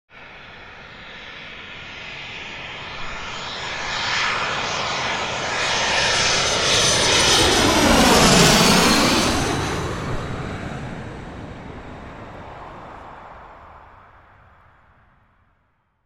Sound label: Jet Aircraft; Jet aircraft passing overhead and landing with reverse thrust; Airplanes (Sound Effects:Vehicles)